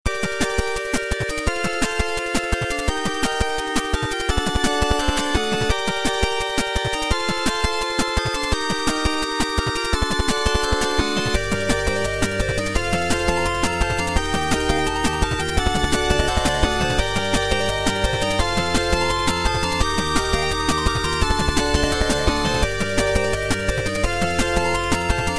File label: action